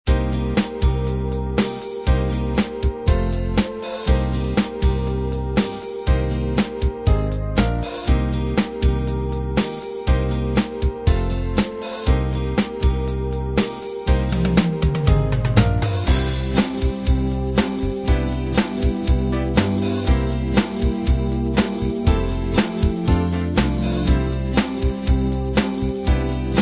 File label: classic beat